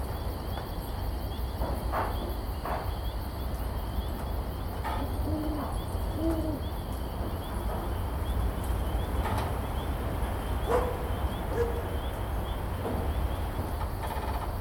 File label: Amb Dojo Spooky; Fruit Ninja; Android iOS mobile gamerip 2010